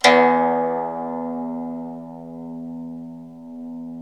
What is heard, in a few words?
SU GZHENG, Earth Tones